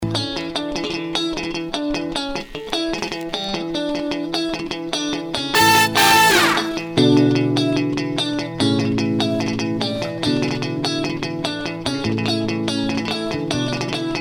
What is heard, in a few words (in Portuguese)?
passagem de som